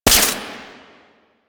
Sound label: Mech; Warframe; Fulmin Prime